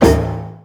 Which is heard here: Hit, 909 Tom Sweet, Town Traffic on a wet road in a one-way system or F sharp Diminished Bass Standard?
Hit